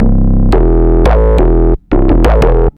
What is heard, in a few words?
Synth, Drum n Bass; KJ Sawka; Drum n Bass & Beyond